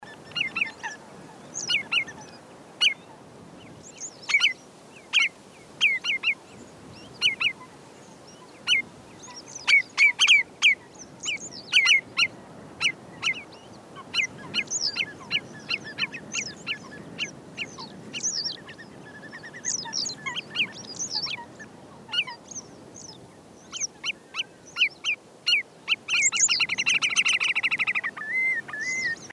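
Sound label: porucheynik golos, marsh sandpiper voice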